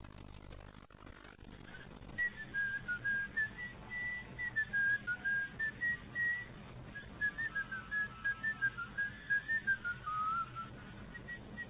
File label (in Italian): flauto